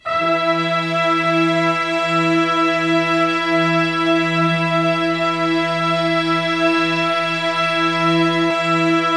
Band Orchestra E6, brass; Giga Samples Collection

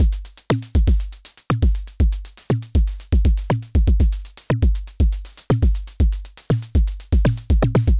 Anabeat Mix, Beats

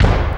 Snare, Fairlight CMI III

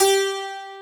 12STR, PIANO; AKAI S-Series CD-ROM Sound Library VOL-8

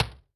Distrt F, Tom, Yamaha DTXpress-II

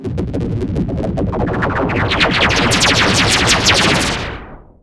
FX SWEEP

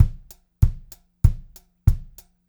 BEAT, Pop-Rock Drumloops; USB Soundscan vol.37; AKAI

